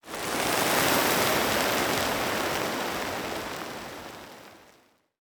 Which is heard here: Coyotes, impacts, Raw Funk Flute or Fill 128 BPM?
impacts